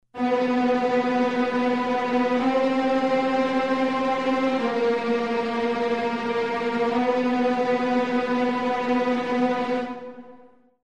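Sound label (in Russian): trevojnaya scena, alarming scene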